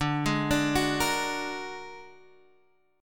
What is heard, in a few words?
A sharp Augmented 7th D, guitar